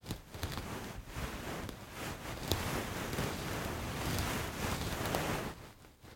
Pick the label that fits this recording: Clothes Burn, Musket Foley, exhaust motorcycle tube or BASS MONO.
Clothes Burn